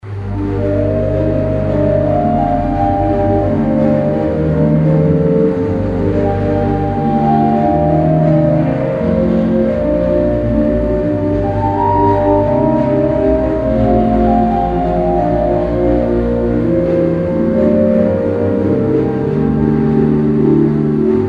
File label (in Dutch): Fluit